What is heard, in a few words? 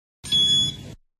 Opal Card Tap Sound; NSW